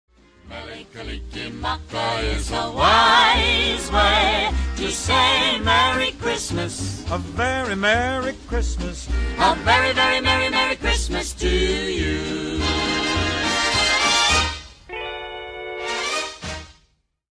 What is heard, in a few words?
hawaiian christmas song